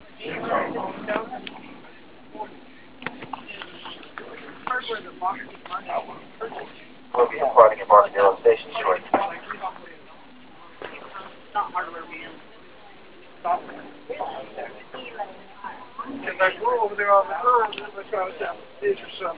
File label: gravel